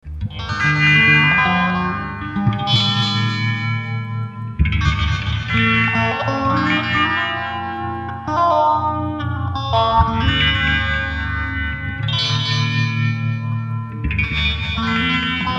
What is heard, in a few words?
zipper loop